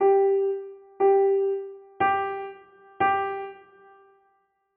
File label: bechstein, piano